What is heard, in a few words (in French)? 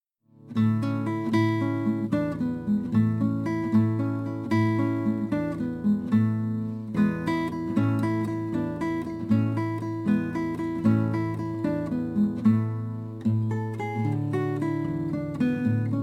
valse